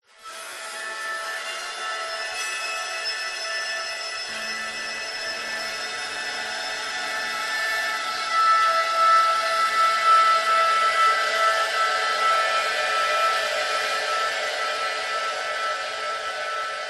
horror accent tense chilling fear shrill metal scrape processed; zapsplat